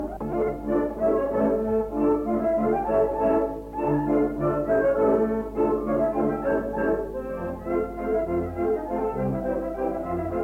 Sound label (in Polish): Instrumentalny